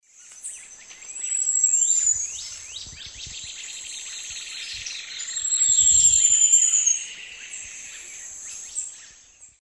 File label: Epinecrophylla haematonota, song; S. America; Eastern Lowlands